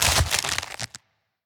Death Bug Brutal

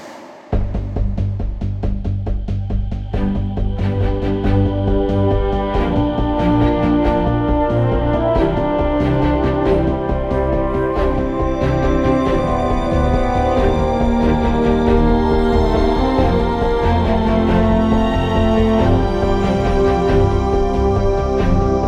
Main Menu Hero Theme Loop

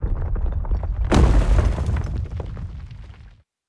Enemy Boss Ground Open; Smilinguido

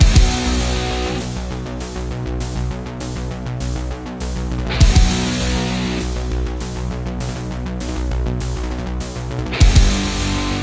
vidgame loop; escape from hell platform game; android

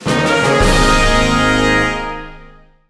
Home Menu Jingle; Pokemon Dream Radar

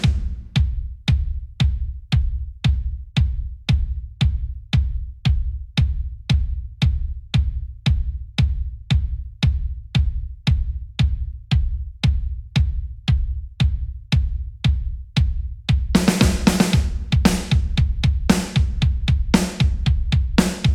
Instrumental Kick and Snare; nowhere station

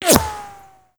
bullet flyby slow; gamerip; shattered horizon 2009

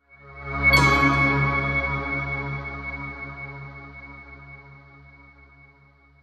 109BPM; Spaceship3StageGameEnd

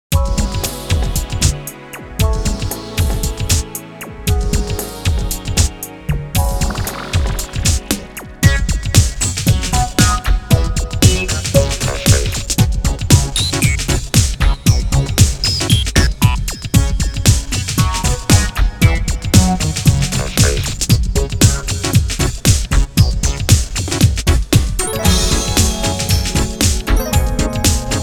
Techno; pop n music; dreamcast

